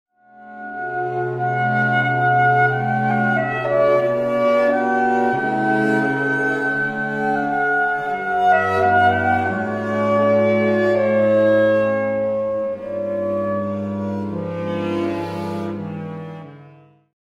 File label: mozart clarinet